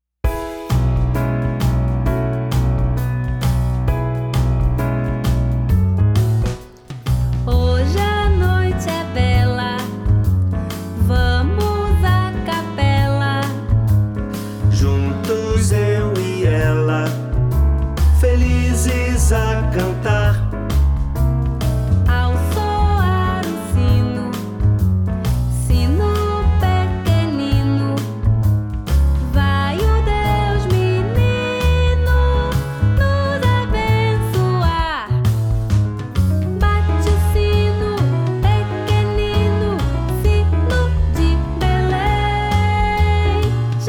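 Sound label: Jazzy Jingle Bells, soprano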